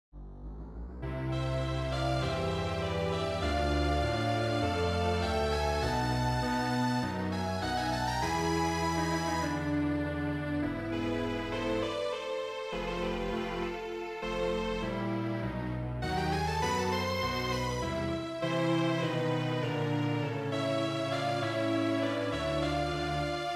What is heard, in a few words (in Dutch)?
Strijkersensemble, Tango Orkest